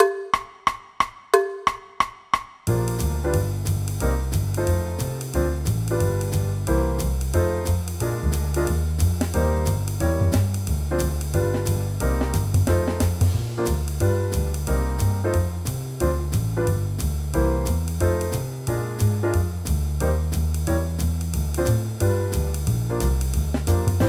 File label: Guitar Playalong